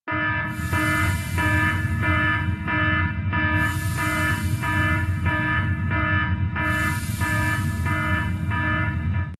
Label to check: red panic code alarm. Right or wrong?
Right